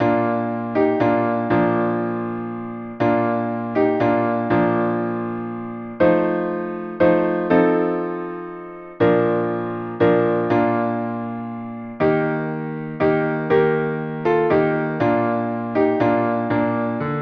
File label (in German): Klavier